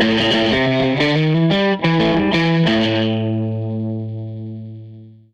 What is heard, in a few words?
Les Guitar Loop